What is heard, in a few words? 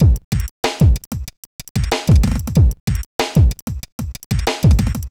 R&B